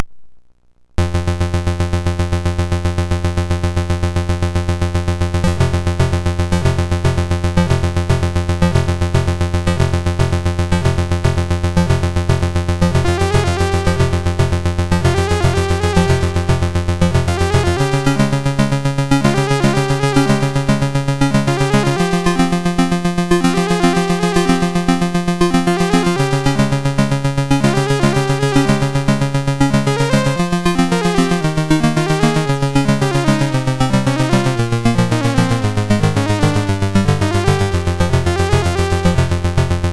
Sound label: Synth Sample; MOS6581R2